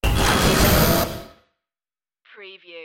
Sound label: sci-fi door movement